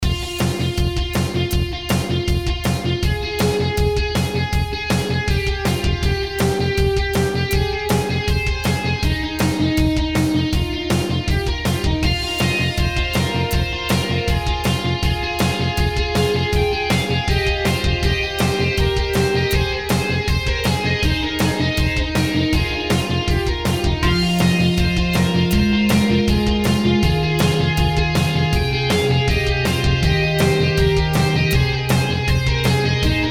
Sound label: Rock loop Ambiant